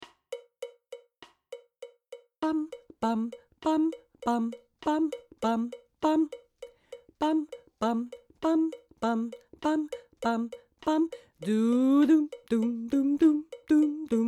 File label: Jingle Bells hommes